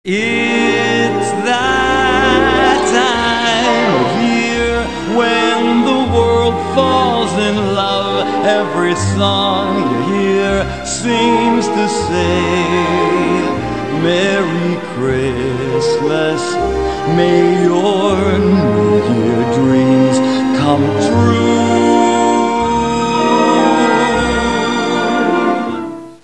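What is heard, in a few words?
xmas waltz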